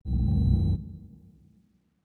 middrone; ゆめ2っき

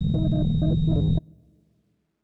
low drone; ゆめ2っき

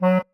marimba penguin; ゆめ2っき

